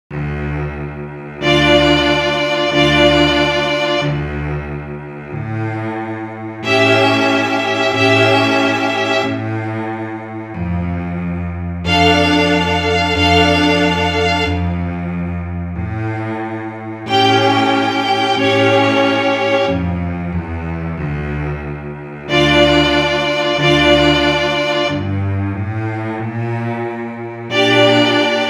koncert dlya skripki sol minor Largo

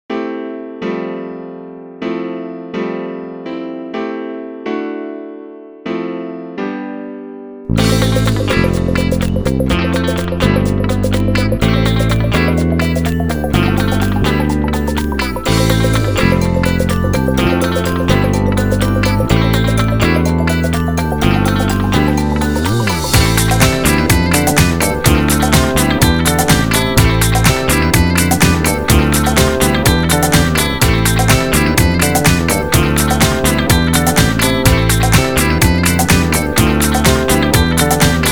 russkii rok

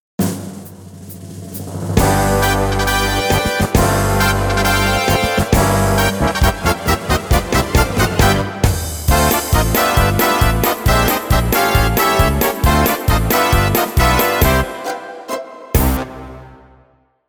korotkie fanfary

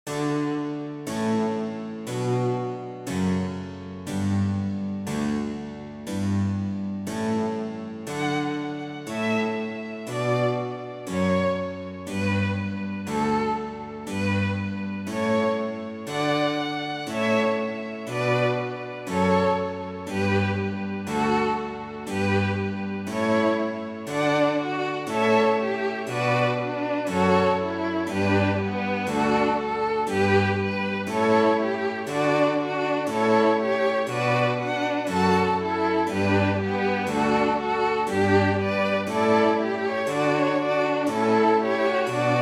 Canon in D (vse golosa); iogann pahelbel

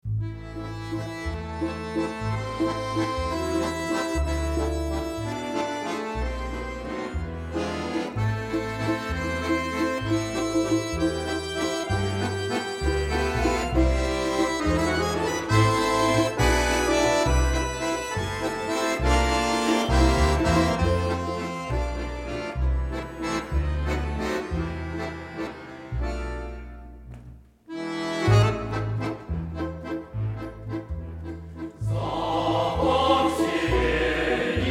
hor

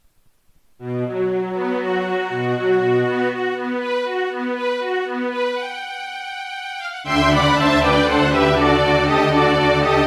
koncert dlya skripki sol major; f.zeic

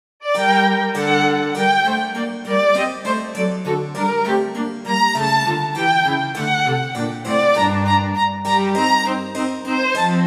koncerta a. vivaldi sol minor dlya skripki

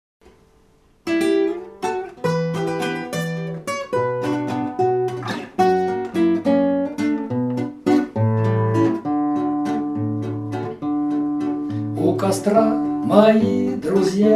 zvonkaya gitara

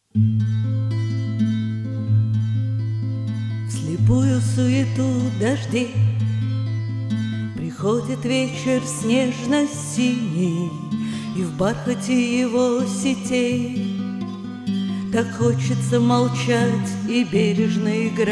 menuet

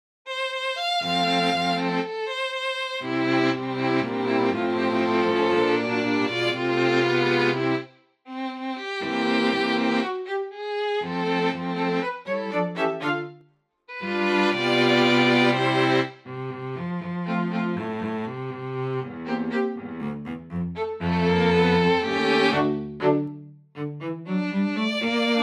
strunnyi kvartet (vse golosa); m. glinka

